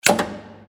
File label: electronic switch